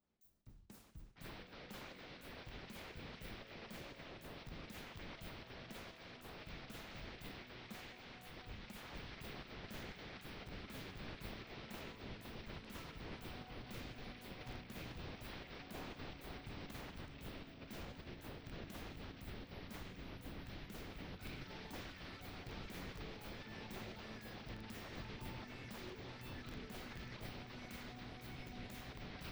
ROCK RIFF Residual Noise; OGG Highest

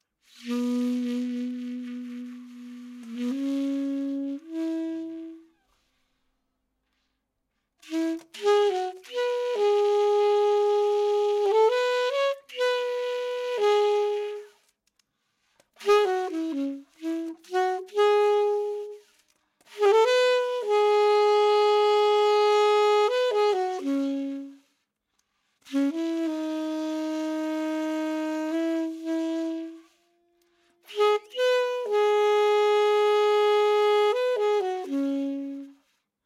Saxophone Recording

